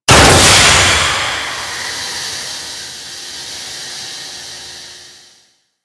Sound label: Defense Cryo Pod Damage; Warframe